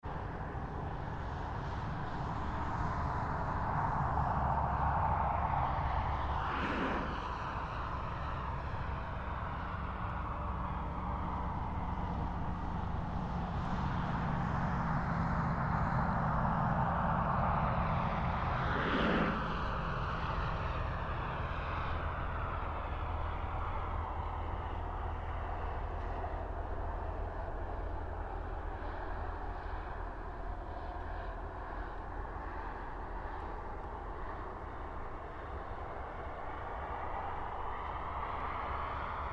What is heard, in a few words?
Traffic Country Road 2 Lanes Distant; Traffic and Cars